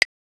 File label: LM-1 WOODBLOCK, LinnLM1, perc; drum machine